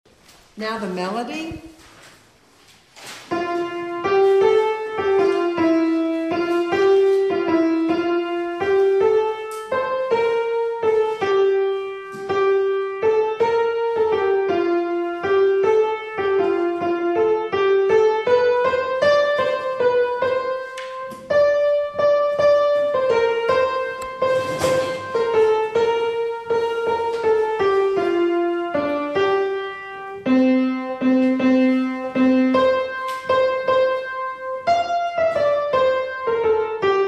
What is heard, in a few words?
piano melody